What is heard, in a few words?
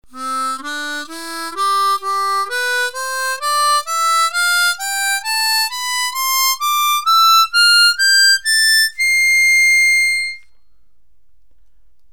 scale phrase, mundharmonika; suzuki mundharmonika